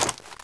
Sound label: flare pickup; weapons